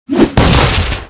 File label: ATTACK